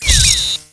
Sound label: misc error; Tribes2